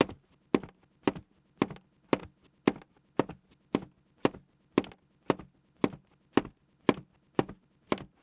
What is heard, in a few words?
Footsteps Wood; HauntedBloodlines; SFX Producer; MinorHallutinationsSounds